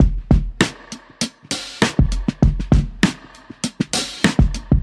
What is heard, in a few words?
starting to be, drum loop; Drum loops